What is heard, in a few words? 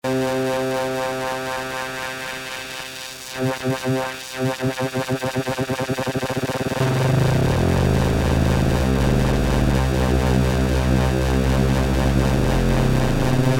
SQ Noise